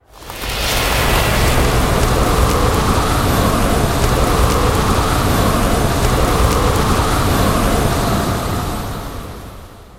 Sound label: Ice Barrage; solaine